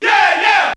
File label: VOX